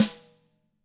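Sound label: DW HIT, drums, snare; ILIO; Double Platinum Drums 1; DW SNR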